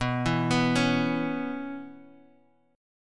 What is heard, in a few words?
Db Minor 7th B, chord